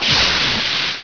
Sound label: pff